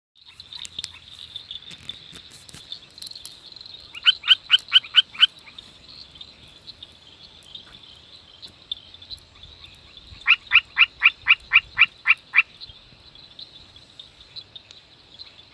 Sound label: Nannophrys ceylonensis